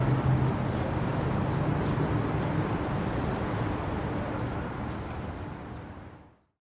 car, city

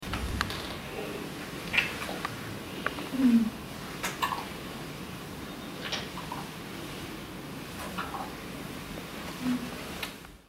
kiss

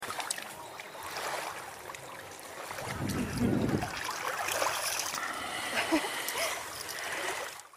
sea

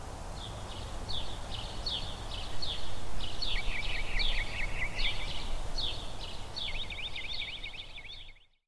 park, outdoor

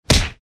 Kick; ToBeSIgma

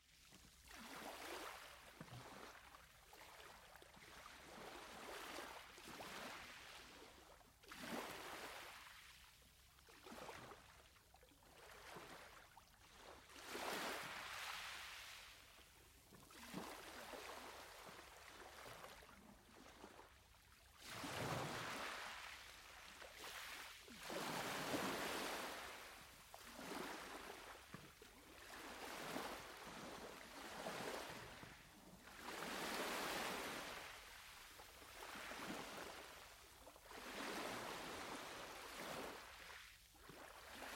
waves; A succubus is born

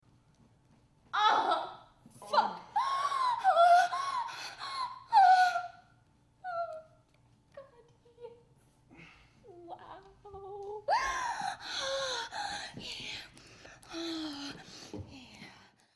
moan

